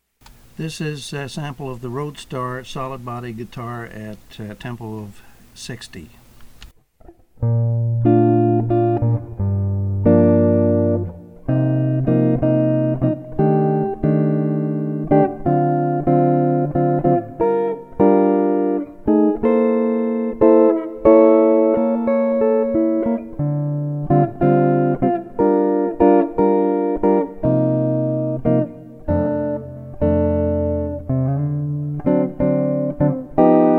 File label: 2 Guitars Ballad 060; jazzu